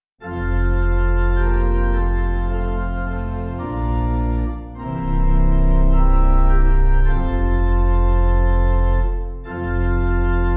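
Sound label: Pipe Organ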